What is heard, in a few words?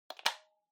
Portable Cassette Earphones Plug In